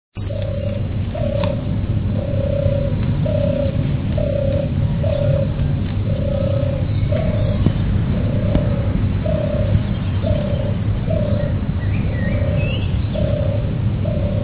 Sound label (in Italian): tortora